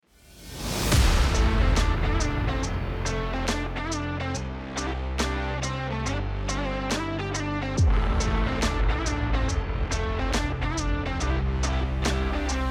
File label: electronic rock